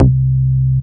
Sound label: Basses C; Vengeance Samples